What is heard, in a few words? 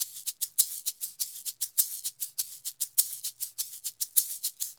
Eggs 100, Drums & Percussion; Best Service; Festa Latina VOL-1